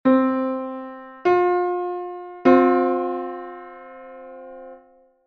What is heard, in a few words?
533,33 fourth, 9-EDO